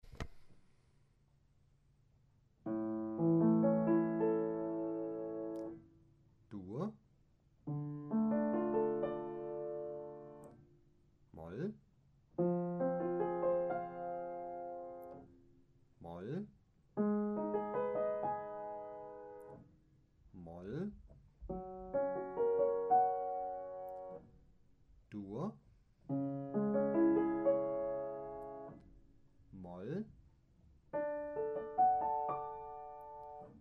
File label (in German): dur moll; gehoerbildung